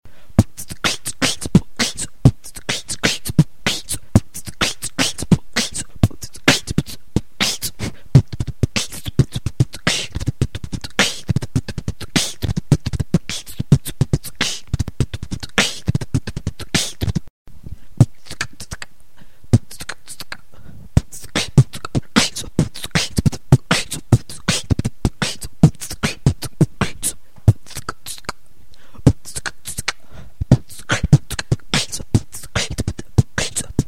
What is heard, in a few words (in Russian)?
битбокс; Батл новичков